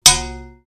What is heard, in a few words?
Battle axe shield impact